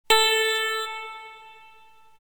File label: tone A